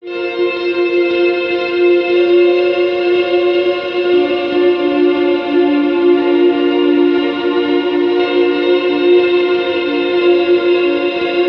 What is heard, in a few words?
Spooky Spacy Slightly sad Echo Reverb; CUES for film TV games etc